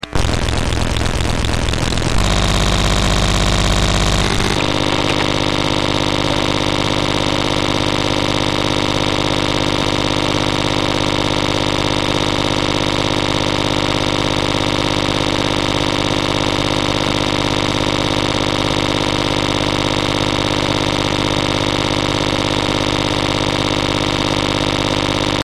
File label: Motor